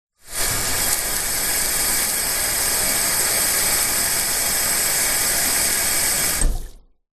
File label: household shower outside on off